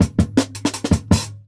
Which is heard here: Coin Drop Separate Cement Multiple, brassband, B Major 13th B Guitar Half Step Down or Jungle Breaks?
Jungle Breaks